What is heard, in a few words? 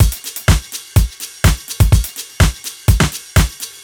housey basic, 125 Beats